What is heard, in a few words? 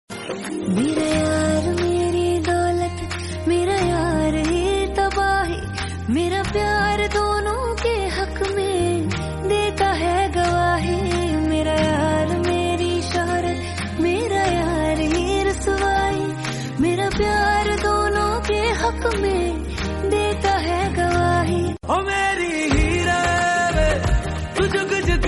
Female Version